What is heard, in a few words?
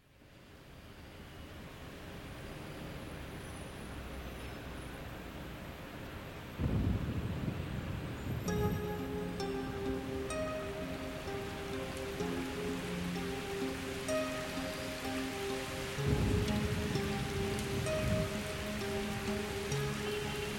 (karaoke); Ranma